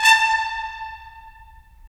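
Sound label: brass trumpets staccato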